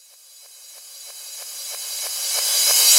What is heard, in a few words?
Reverse Cymbal; Drums; House; Best Service; House Definition VOL-1; Cymbal Stuff